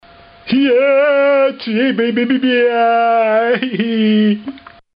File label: chorinho